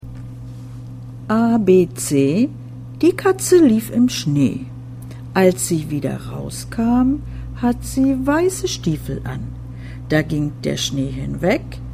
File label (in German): A B C die Katze lief im Schnee